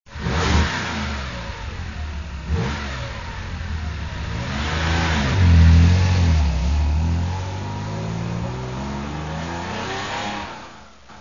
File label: 911 turbo